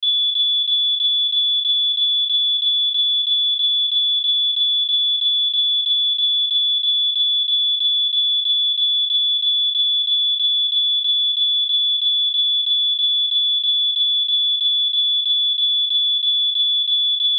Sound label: Alarm Smoke Detector Fire Loop; Household; Sound Effects:Electronics